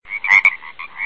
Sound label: stream